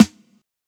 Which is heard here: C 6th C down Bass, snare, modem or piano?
snare